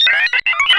sphere blips lg; npc; Portal 2

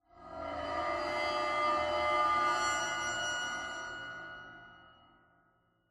cymbal med; ambient; cymbals

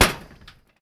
metal grate, player footsteps

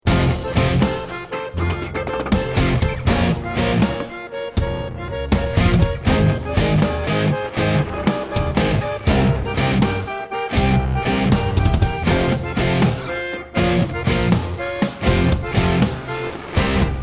choose team

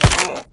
damage, player

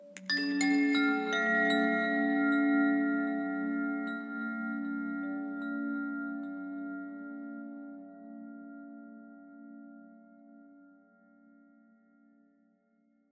ambient abstract chimes high

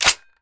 bizon bolt forward; weapons; Counter Strike Model Pack; CS2

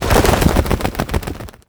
pigeon wings takeoff; critters

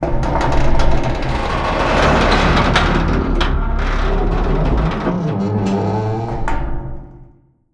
gate raise; hideout gate

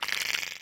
slobber, clams; infestation

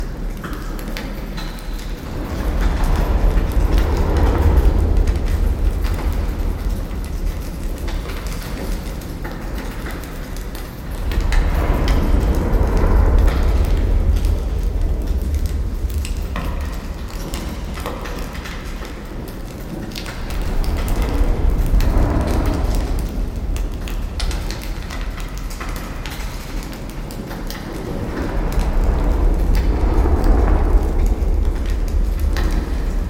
apocalypse, ambient

